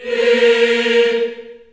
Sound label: A#3, Choir